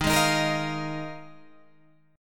Eb Suspended 2nd Suspended 4th, Guitar, Open G

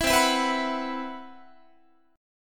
C Major 7th Flat 5th, Guitar, chord; CM7b5; strummed; 19,20,19,21,22,22; 4A,4E,5A,5D,5G#,6C#

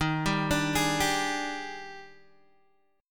Eb Major 11th, Guitar, DADGAD

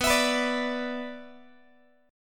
B-Minor Double Flat 5th, Guitar; Bmbb5 strummed; JGuitar

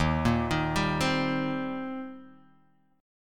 Eb 6th Eb down Guitar Drop D

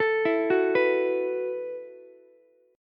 E Suspended 2nd Suspended 4th E, Ukulele; arpeggiated; JGuitar

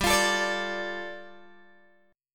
G 7th Suspended 2nd G down Guitar Open G

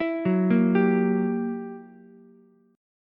Gb 7th Flat 9th Gb Ukulele C6; arpeggiated; JGuitar

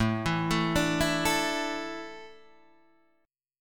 A Suspended 4th, Guitar Open D

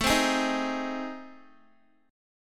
A Minor Major 7th Flat 5th, Guitar; strummed; AmM7b5; x,12,12,13,15,x; JGuitar

